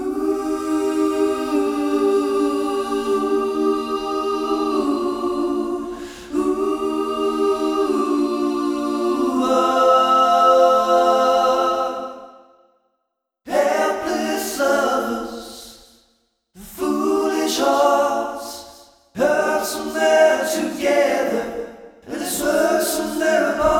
Vocal Backing Mix; illusion

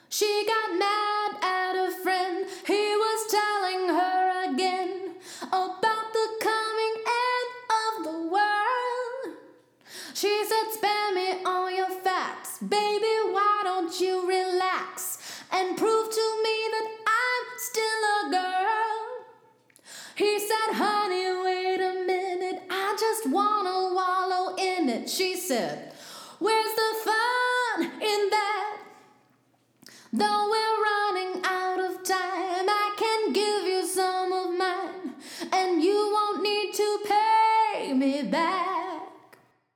Vocal Room Large Tiled; Seventh Heaven Professional

